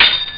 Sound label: pling; ST-XX Amiga Soundtracker Sample Packs